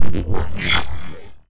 alien game ui glitchy sci fi bleep digital short laser